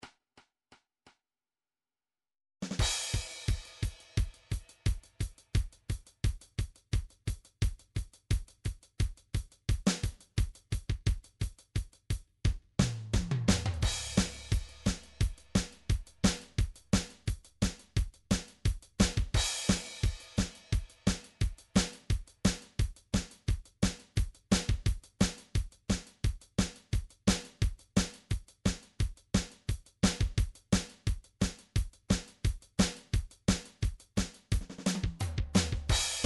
alternative 174 1 4 note kick, midi drum styles

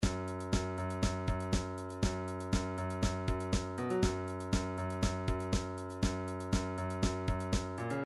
drums bridge, midi

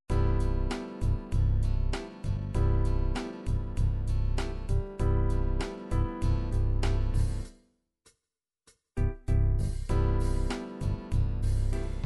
8 Bit 8beat Light, midi

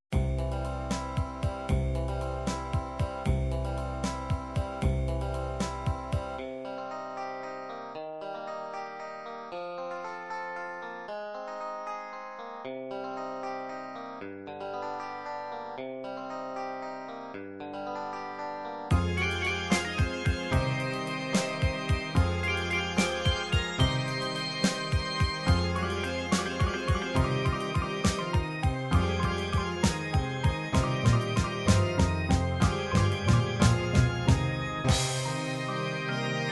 techno the house, midi

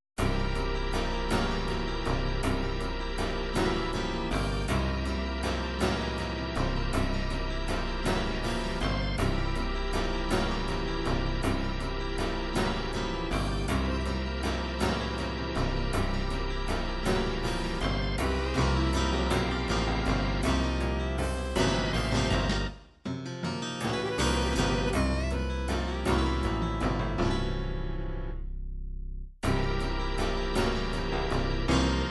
folk rock

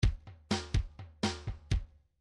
midi grooves rock; Bdf Midi File Grooves

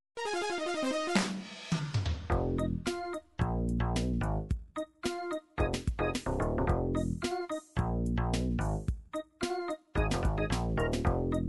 anime chacha

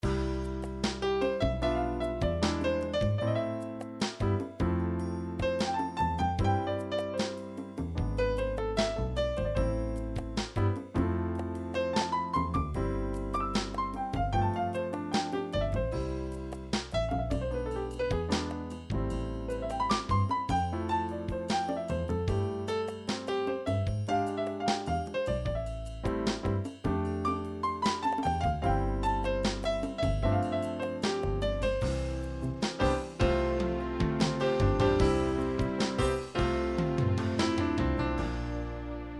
dance techno

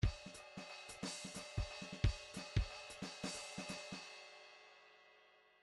grooves 3 4

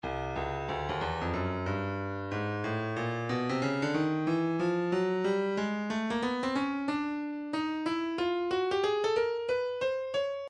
breakbeat midi files 092 093 bpm; Bandana B; Stylus Breakbeat Midi Files

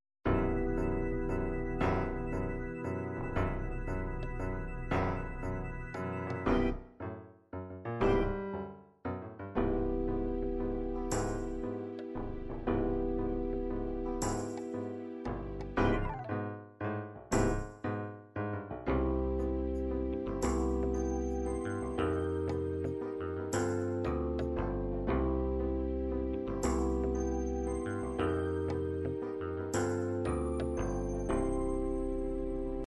ballads ballad gospel, midi

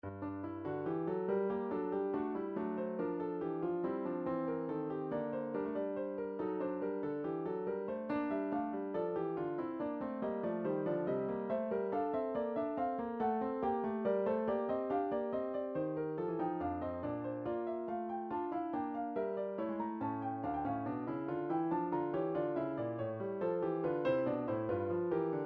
piano, midi; piano version of bachs two part inventions

